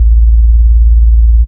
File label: DR. LOOP, BASS; X-Static Goldmine 3